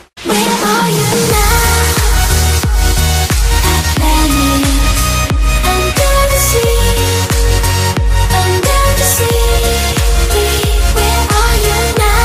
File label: iPhone Ringtone; ringtones